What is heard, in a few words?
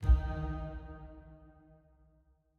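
Stinger Neutral D sharp; Desert SFX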